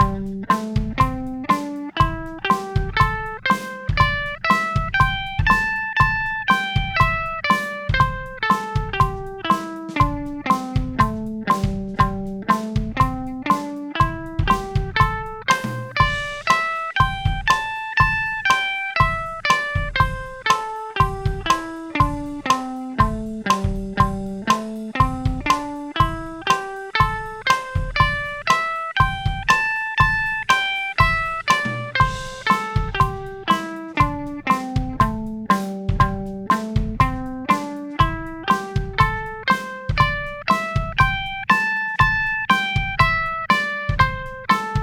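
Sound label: Am 120 bpm; A Minor Pentatonics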